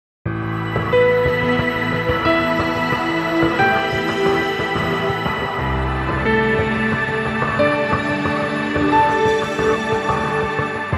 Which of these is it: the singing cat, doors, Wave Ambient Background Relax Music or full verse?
Wave Ambient Background Relax Music